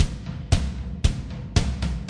115 big heavy kick snares, DRUM LOOPS and BREAKS

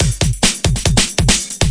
140 gated breaker, drum loops and breaks

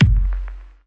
boomy dirty kick; Kicks; single hits

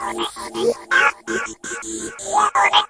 165 noise melody echo, synth and electronic loops

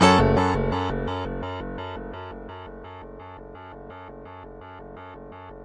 169 octave pianoish descending; KEYS ORGAN PIANO LOOPS

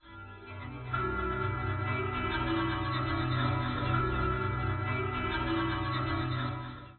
Alien Minstrals G1, PADS and STRINGS

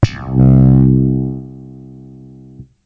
synth slap; Basses

